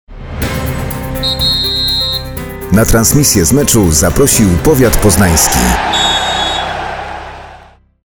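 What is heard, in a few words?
jingle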